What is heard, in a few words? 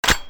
mg42 clip out